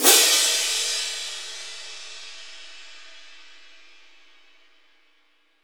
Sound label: cymbal